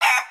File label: cluck; creatures organic